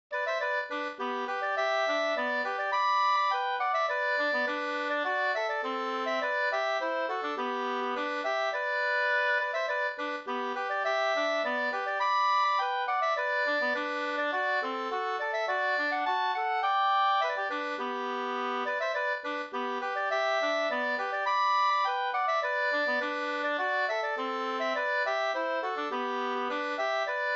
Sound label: polka; e David Jonsson Grums Vr 2st